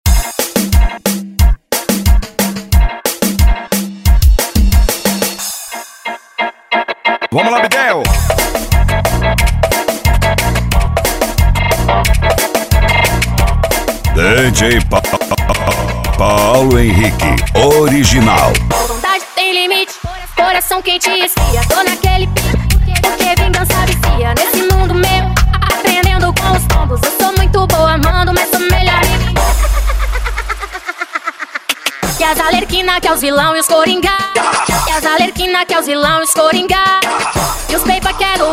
tecno melody

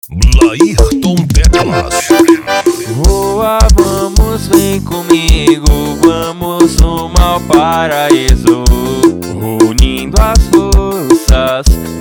abertura piseiro remix; dragon ball kai

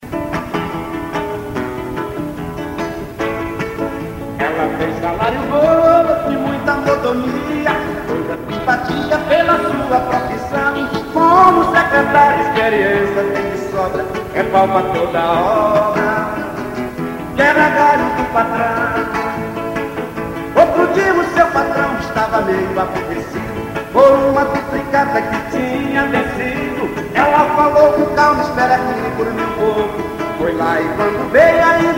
voz violão

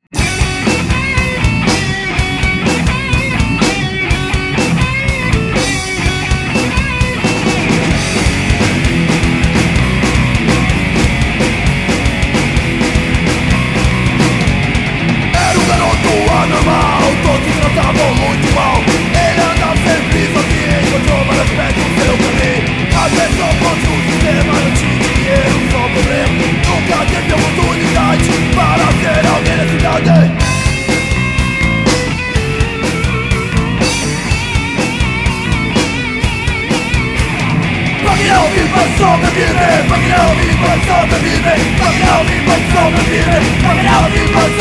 rock punk nao vive punk sobrevive

